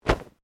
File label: Clothing Shirt Cotton Flap Sharp; Movemement sounds for various articles of clothing; Dressing